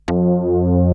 Synth hits and riffs

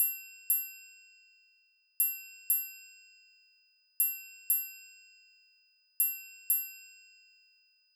Triangle, Nu Disco, 120bpm Cm; Zero-G; Construction Kits; Legacy

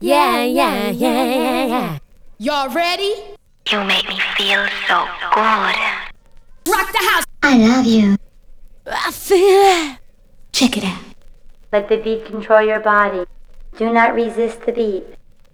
fem vox, breakcore; voxandchords

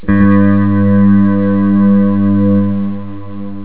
sci-fi; jazz jackrabbit 2

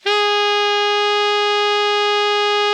ALTO MF G#3, Sax

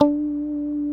D 4 FUNK SFT, GUITARS, FUNK STRAT; Spectrasonics; Hans Zimmer Guitars VOL-1

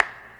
clap, hip; Best Service; Dance Mega Drums

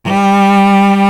solo cello; East West; Global Sounds

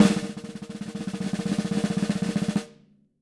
SN ROLL, SD ROLLS, Living Drums

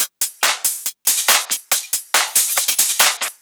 drum Loop 140BPM Full

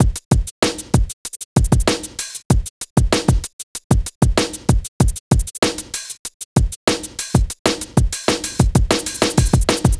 Beat; Sonic Foundry (Sony Creative Software); Infinite Soul VOL-2; Beats

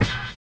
STREET; Spectrasonics; Metamorphosis

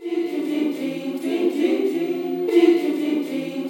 GIRL CHR, FEM. CHOIR; Spectrasonics; Heart of Africa 2

